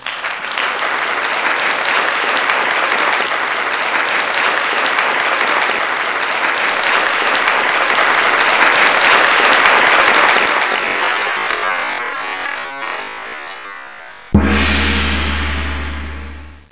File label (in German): Applaus Lang